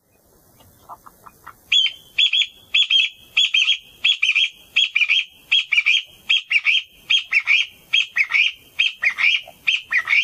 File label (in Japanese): kojukei